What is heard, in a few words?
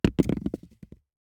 DICE on Hard Wood, Throw and Roll, Foam, Two Dice; Samples; ArticulatedSounds; DICE Sfx Party (Extended)